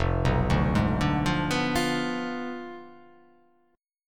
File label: F Diminished 7th F down